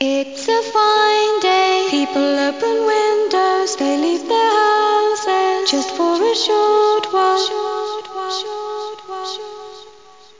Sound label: opus people, windows, vocals; Zoom - Release 2 (1996); Active Software